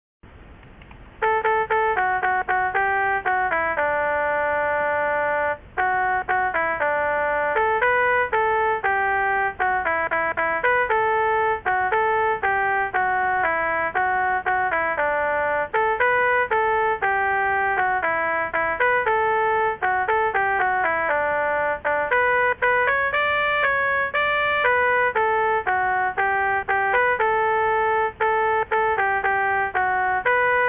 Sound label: shofar; Lamnatseiach; Shiru Lo Shir Chadash 2; A Second Volume of Synagogue Music for the 20th Century